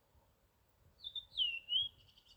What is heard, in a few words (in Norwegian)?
fuglesang